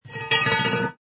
Small anvil being dragged along floor, a short distance; Dragging; Lynne Music